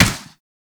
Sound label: Space Ship Turret Fire; Warframe; Grineer Pursuit Space Ship